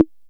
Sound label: TOM, Fricke MFB 501